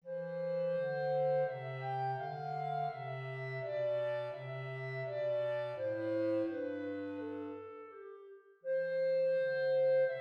menuet majeur; bach johann christian

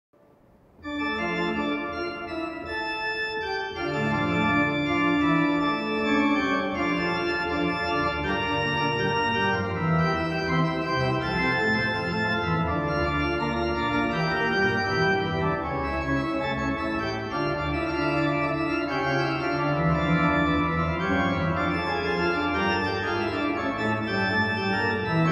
duo pour orgue; lasceux guillaume

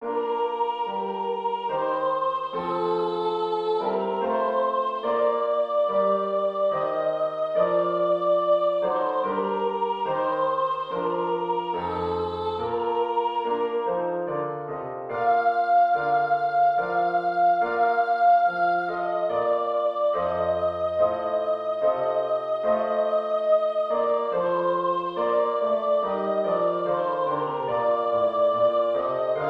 hymne national anglais

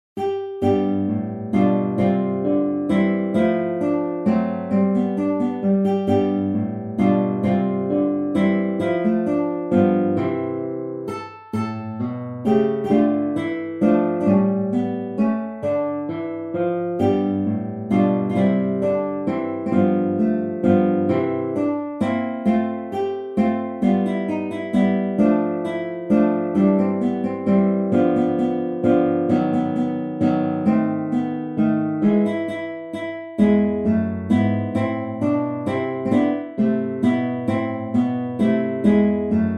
etude en do majeur

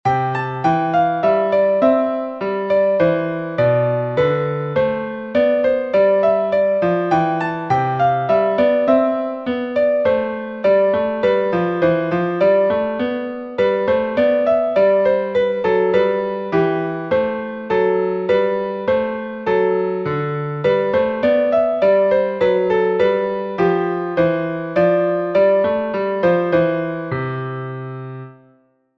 menuet; John Banister

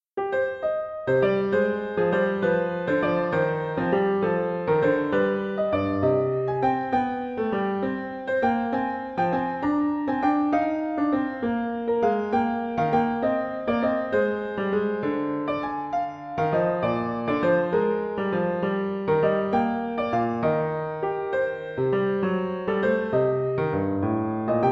sicilienne sol mineur

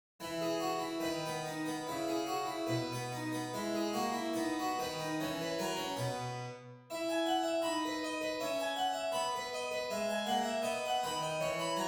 gavotte majeur; corelli arcangelo

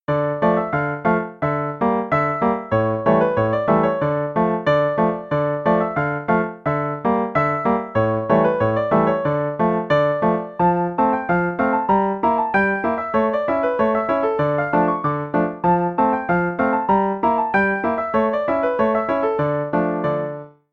polka mineur

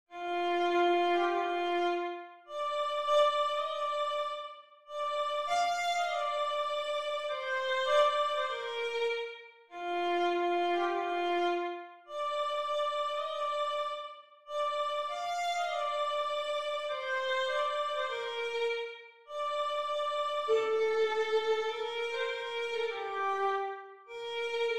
hymne national italien; mameli goffredo

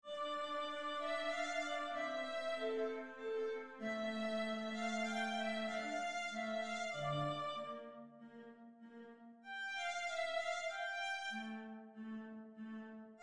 menuet en re mineur; mozart leopold

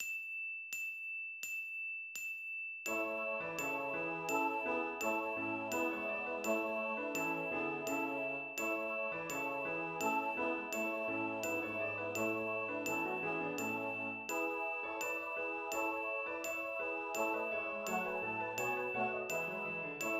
avec pulsations rythmiques; deck the halls; traditional

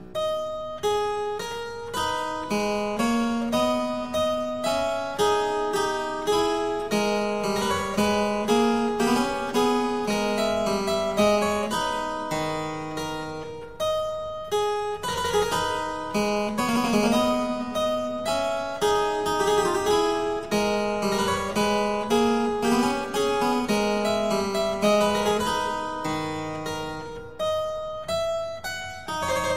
menuet; Wilhelm Friedemann Bach

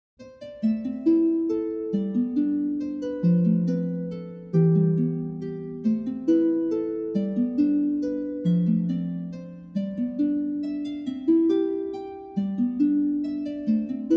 harpe

